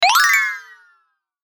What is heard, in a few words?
Musical Cartoon Tinkle Descend; Cartoon Sound Design; Production Element Imaging Element Accent Transition; Blastwave